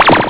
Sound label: warp; Coaster